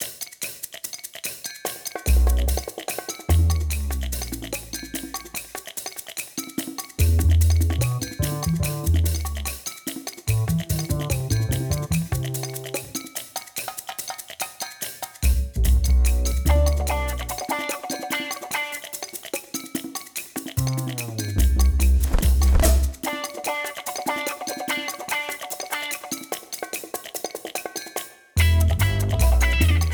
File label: Trouble in Paradise (Drum 'n' Bass); Gourmand Land